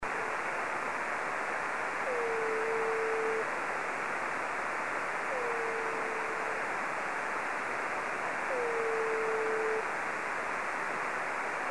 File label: beacon, amsat